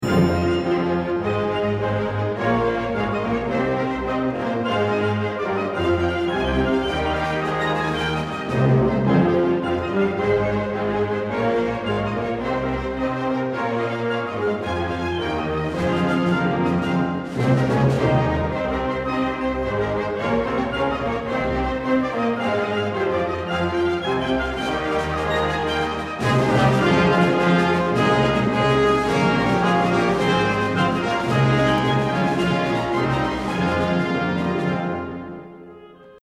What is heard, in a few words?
Violin Fl Ob Hrn Folk Song Suite